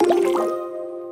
win multiline; Hungry Shark; Wazdan

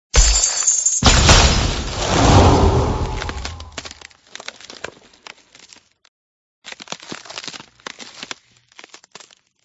buff ice; Thunder Fighter

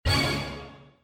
prize hit; Magic Hot; Wazdan

